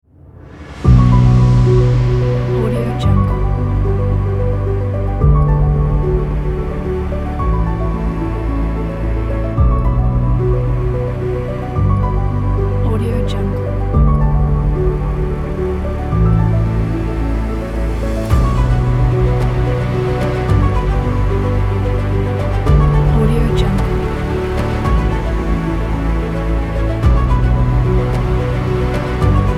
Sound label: historical adventure; cinematic-trailers